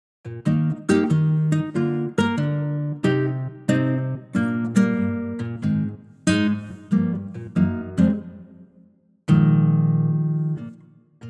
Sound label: Eb guitar